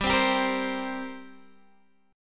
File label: G Minor Double Flat 5th G, Guitar, Open G; chordshape; down